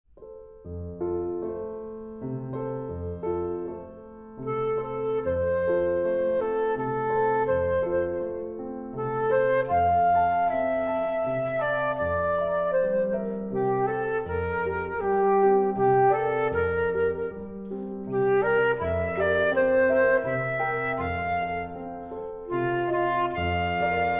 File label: performance Flute; Brahms; ABRSM